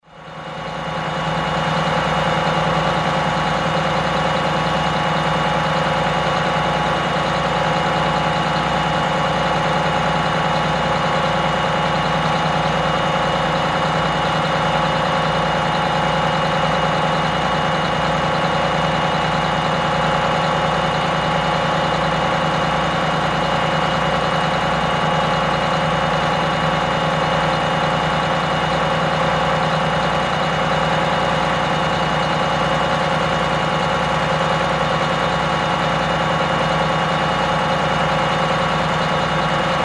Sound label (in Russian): rabotajuschij avtobus